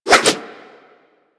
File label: Axe Small Spin Swing; Warframe; Weapons